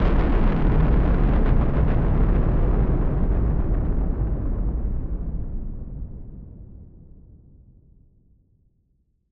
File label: Noise Bomb Rumbler; Noise Bombs; musicradar; bomb factory